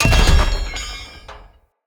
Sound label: bird repulsor destroy; Objects; Thawtide